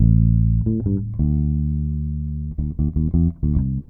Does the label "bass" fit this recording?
Yes